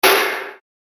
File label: Sci-fi Hollow Impact; science fiction sound effect; Andrew Fleming